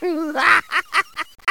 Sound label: Yeah Squeak